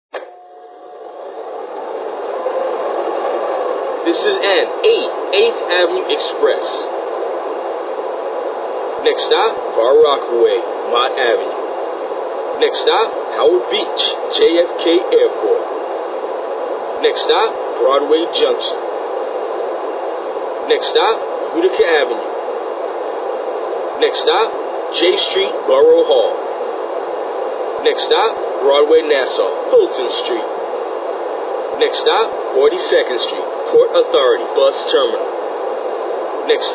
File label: R40, trains; MTA